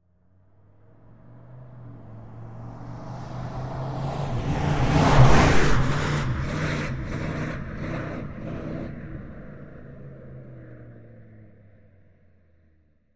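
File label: Dodge Ram Fast By Shake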